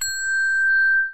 lightbulb; gyakuten touhou

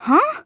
"huh"; aoi

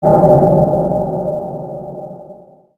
suspense

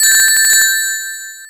lose rings; sonic

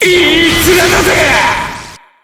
overdrive

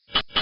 knock; clippy

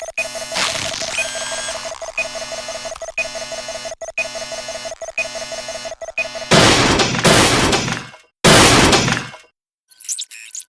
breakdown

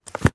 tape headphones

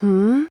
hmmm

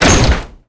door open violent

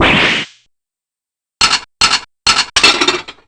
damage

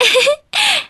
giggle

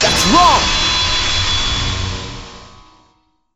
hat counter; shuichi

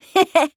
hehe

